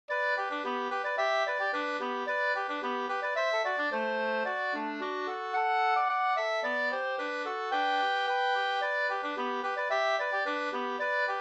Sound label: polka